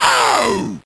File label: pain; quake2; ranger